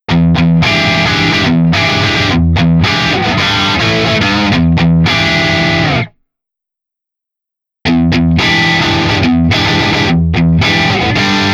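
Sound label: Crunch SC1 Single coil, pickup; Crunch SC2 Single coil; panico pickup sc2 single coil high gain hot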